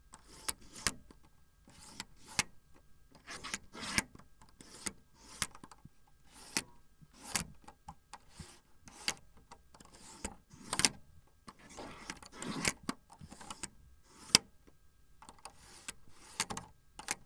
Mixer Faders

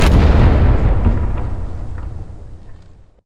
heavy sniper rifle

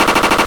Machine Gun

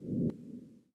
Whoosh Dark Hissy

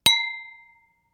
Wine Glass

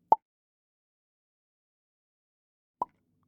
Pop

